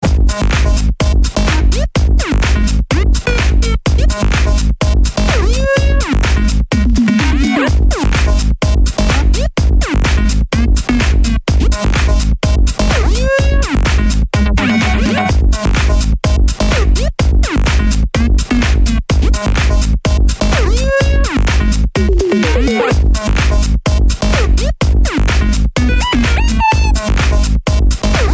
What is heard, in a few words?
Techo Mix